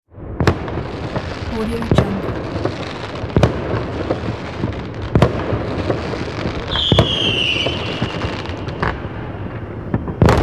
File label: fireworks pack; soundfoodsfx